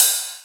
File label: Ride; FLPRO